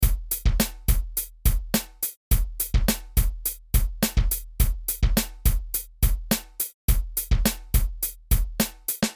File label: beat